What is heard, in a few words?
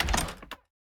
Door Open Mines